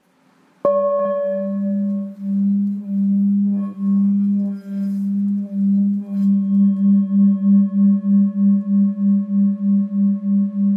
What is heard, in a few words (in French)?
Bol bengale 900g-1kg Dodieze